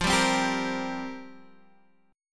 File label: F Suspended 2nd Suspended 4th, down, Guitar, Half Step Down